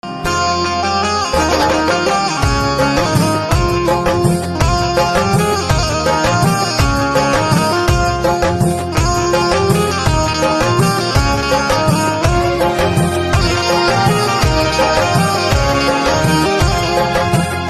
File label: Banjo Instrumental